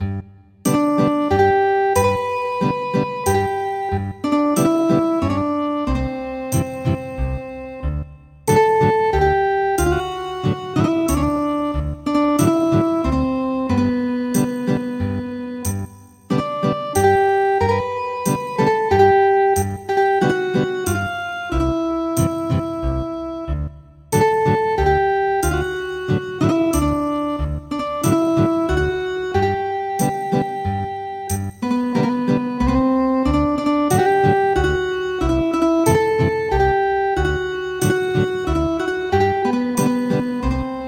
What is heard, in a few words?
Ethnic Guitar, traditional; Santa Clara